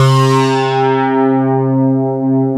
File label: MKS SYNCPAD, ROLAND MKS-80; Rubber Chicken Software Sample Bank